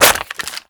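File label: weapon foley masada reload clip in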